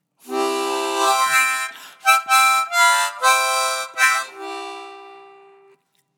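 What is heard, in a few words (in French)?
impro solo harmonica; loto sonore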